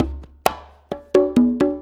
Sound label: Congas, Perc Loops; Drum Tools